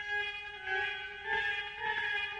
Afro Chords Loop 1 Bar 100 Bpm

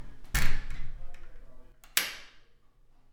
Public Bathroom Stall Door

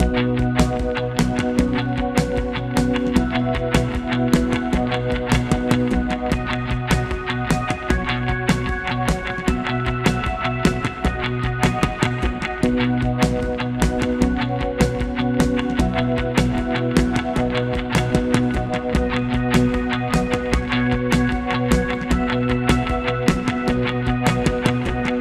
Sport Hero Loop

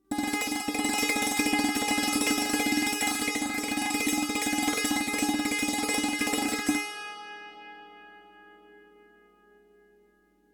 Sant Roll G4

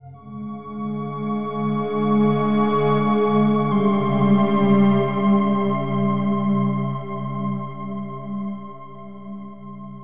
Orchestral F

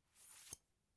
Unsheathing A Knife

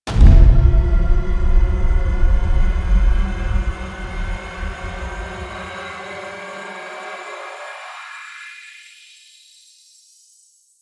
Trailer Hit Double Curse

